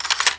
Grenadegun Load; Playable Tutorial Level

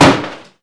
Target Hits; Playable Tutorial Level; TR3

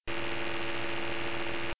Vehicle Divesuit Engine; Playable Tutorial Level

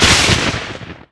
Thunder Crack; Playable Tutorial Level

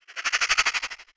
Rattle Snake; Playable Tutorial Level; TR3

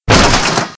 Lift Hit Floor; Playable Tutorial Level

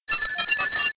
Computer Beepies; Playable Tutorial Level

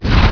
Slam Door Slide; Playable Tutorial Level; TR2

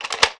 Menu Combine; Playable Tutorial Level

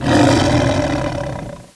Lion Roar; Playable Tutorial Level; TR1

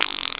Flatulence; TR3; Playable Tutorial Level

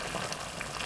Blood Loop; Playable Tutorial Level

